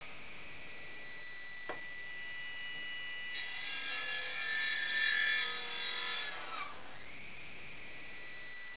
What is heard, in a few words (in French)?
Machines Son